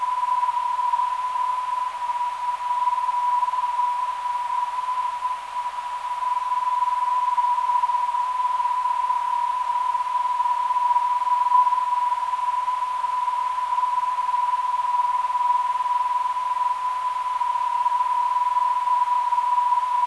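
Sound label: ZR 397kHz, non-directional beacon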